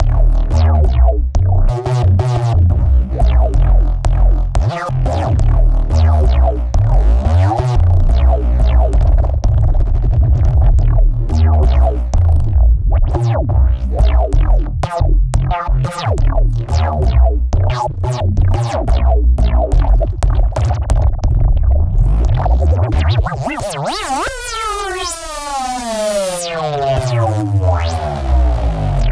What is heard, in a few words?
bass